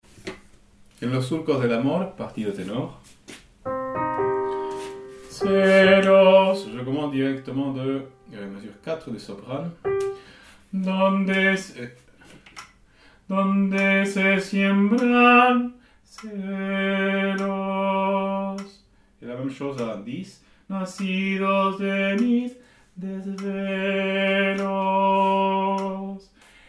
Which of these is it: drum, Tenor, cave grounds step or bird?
Tenor